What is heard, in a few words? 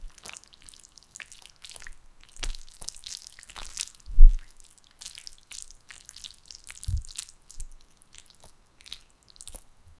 worms crawling on wet ski, annoying